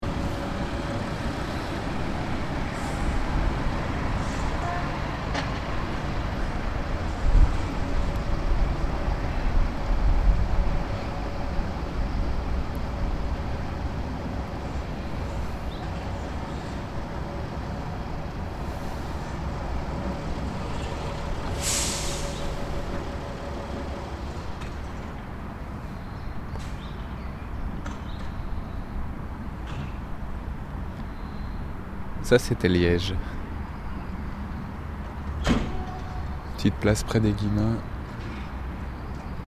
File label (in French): une petite place; liege; 2009 deambulations